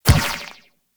Ball Bounce Dirt; Warframe; Missions; Tenno Ball

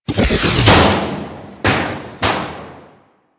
Landscape Heater Vent Lower; ambience; props; one offs; Warframe; Venus Open World

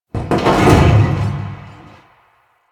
Large Vehicle Creak; Ambience; Props; Warframe; NineteenNinetyNine

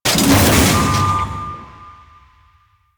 Crew Ship Debris Release B; Warframe; Corpus Railjack; Crew Ships

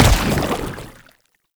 Step Default, Footsteps

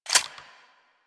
Hand Shotgun Reload

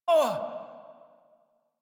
Pain, Barks, Battle; Warframe; Baro Defense

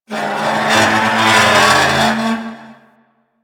Metal Scrape; Ambience; Props; Warframe; Orokin Derelict

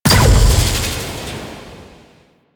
Orphix Shield Arm Break Explosion; Warframe; The New War; Sentient Devourer

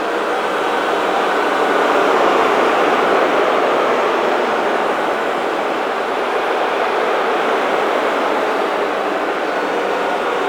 Stadium Crowd Boo Loop; Warframe; Nineteen Ninety Nine; Quests

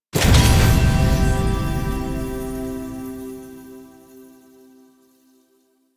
select confirm; Warframe; UI; Duviri

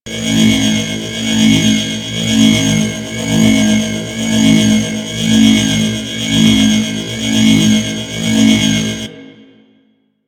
Guild Energy Shelf Loop

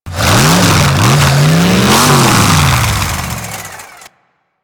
Saw Idle Rev, Melee Weapons; Warframe; Grn Ghoul Saw Player

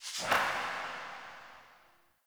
Word Upgrade Word Select, UI; Word Upgrades

